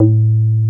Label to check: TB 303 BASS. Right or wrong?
Right